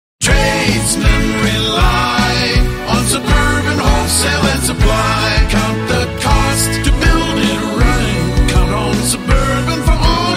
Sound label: web jingle